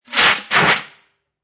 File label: Fire Extinguisher Twice, Powder based Fire extinguisher, two short bursts.; Spraying; Objects; Lynne Music